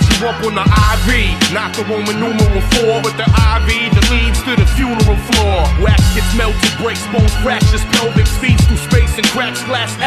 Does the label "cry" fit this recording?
Yes